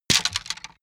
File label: wood sticks small break deep